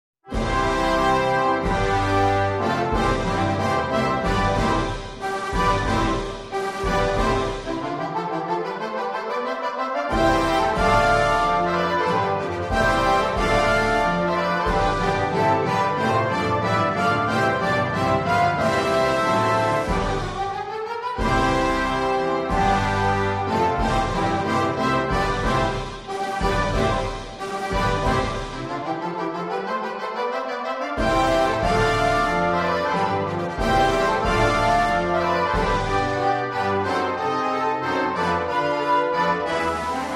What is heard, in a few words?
Festival Coronation March - Fanfare